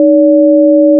ring not int

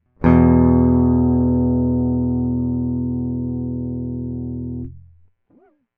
power E

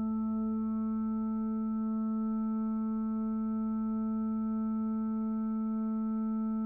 sine A4

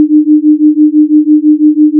sinetone

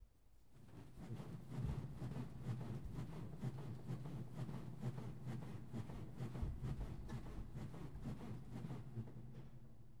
moving bed

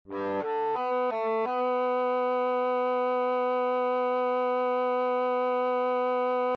bowed

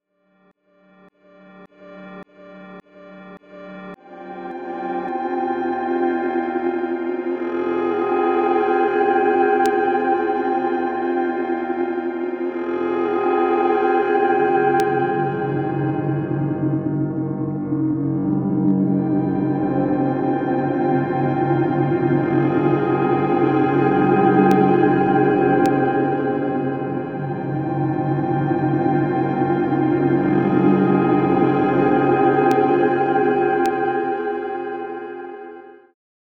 piano waves

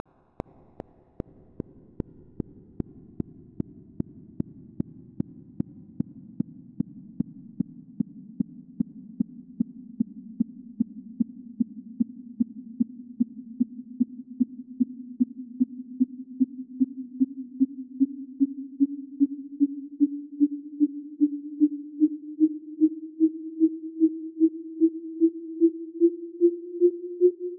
gdp Sin Pulse; sonifications; economic

